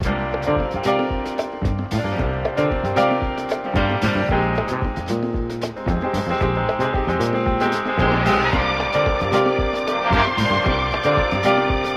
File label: piano, phonky loops